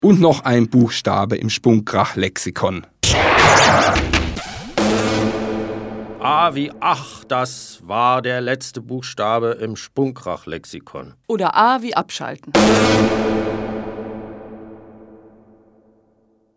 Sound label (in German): abschalten